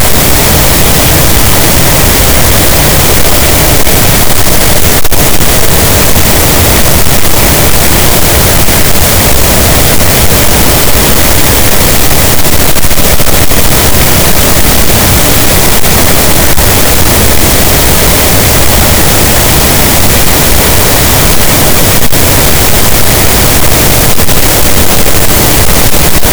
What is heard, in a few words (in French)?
cachalot negatif, gaussian noise